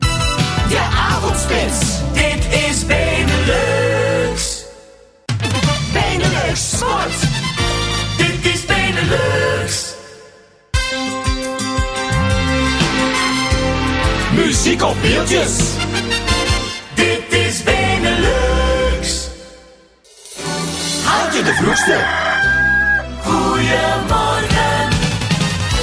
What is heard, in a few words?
jingles; Benelux Beringen